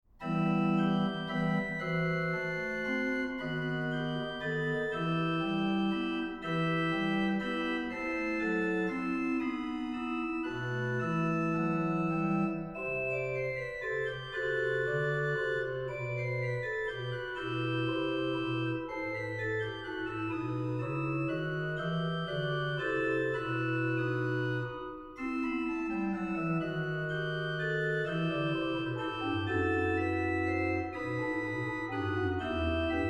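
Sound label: praeludium e minor, organist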